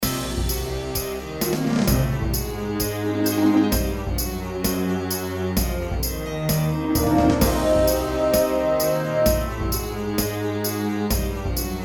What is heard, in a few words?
Midi combat